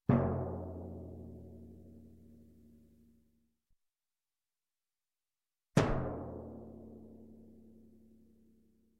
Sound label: Bass Drum single Hit, Music, Percussion; Sound Ideas; Series 2000